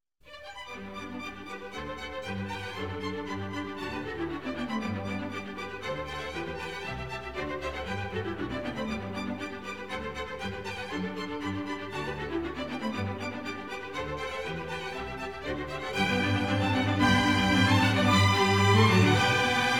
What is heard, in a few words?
Serenade No. 13 for strings in G; Wolfgang Amadeus Mozart; Mozart Greatest Hits